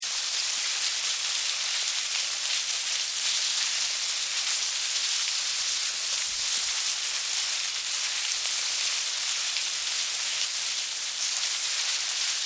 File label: Ants, Army, Bugs, Movement, Colony, Herd, Insects; Sound Effects:Animals:Insects; Blastwave